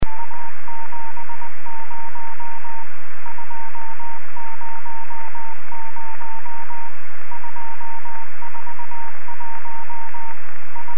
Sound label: beacon, amsat